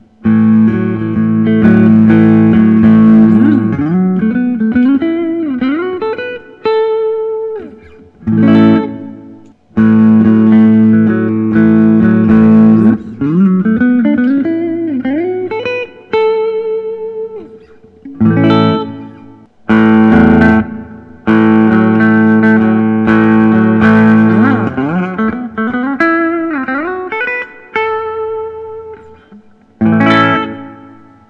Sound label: Collings I 35 Deluxe; 2008; ice tea sunburst